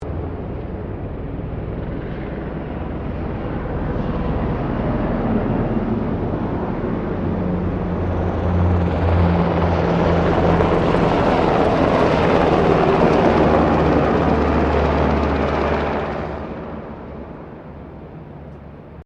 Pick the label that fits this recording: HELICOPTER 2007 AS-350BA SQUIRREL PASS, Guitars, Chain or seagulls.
HELICOPTER 2007 AS-350BA SQUIRREL PASS